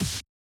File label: Snare